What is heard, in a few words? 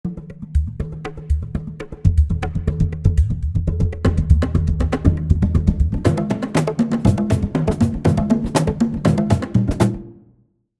startup